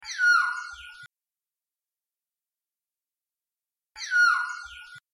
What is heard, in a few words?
oriole call